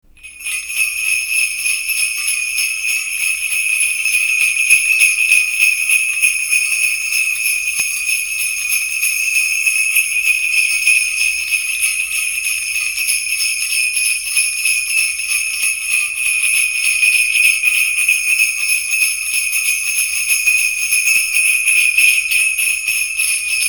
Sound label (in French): clochettes père noël